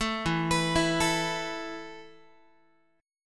E Suspended 4th E, down, Guitar, 4A,4E,5A,5D,5G sharp,6C sharp; Esus4; arpeggiated